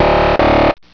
warn no loop; cstrike; moon

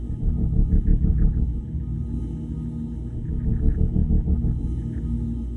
reaktor loop; cstrike

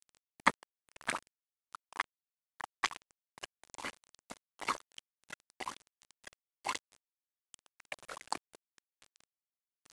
mud squishes; cstrike; muddy

